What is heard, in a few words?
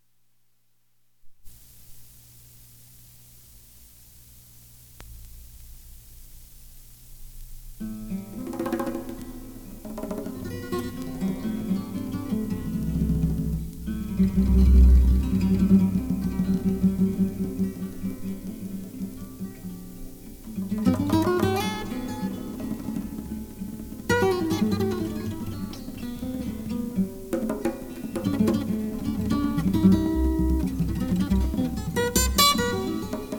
mystery guitar